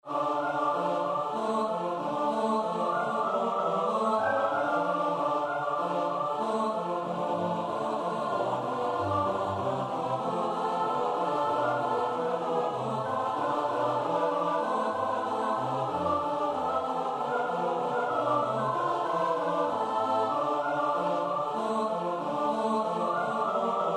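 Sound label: soprano alto tenor bass; Onbekende componist; Badinerie (Scherzo)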